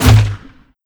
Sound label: riflegrenade fire; midnight guns